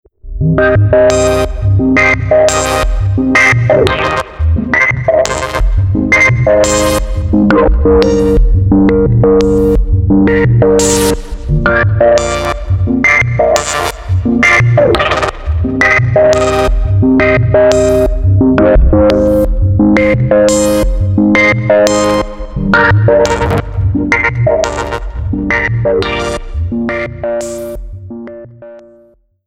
Chord Animator; Preset: Chord Animator; Generate; Newfangled Audio

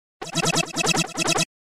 fill synth; ちょいちょい出てくるフィル的なシンセ; NavanaX presets; Nicky Romero KICKSTART2でダッキングさせ、KNOCKで歪ませています

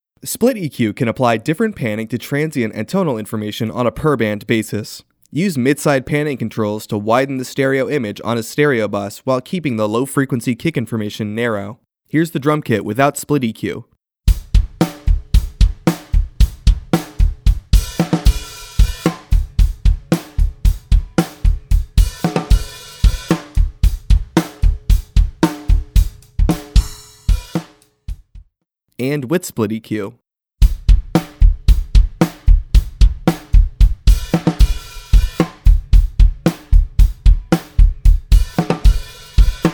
Widen Drums; 拡張; ドラム; SplitEQ

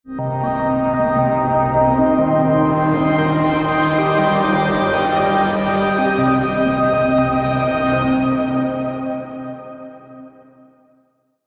Lunar Pads; Preset: AB Lunar Pads; Generate; Newfangled Audio

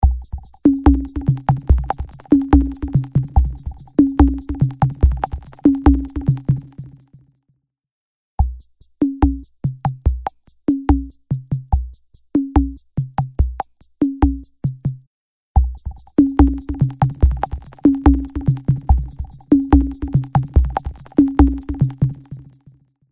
Bossa Loop; H3000 BD Mk II; Baconizer

